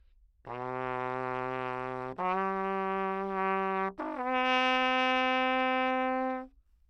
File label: natural baroque trumpet, low; OPENAIR